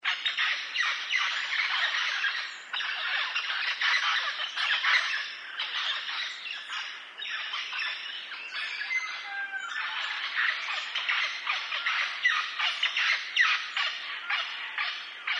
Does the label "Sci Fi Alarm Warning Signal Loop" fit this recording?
No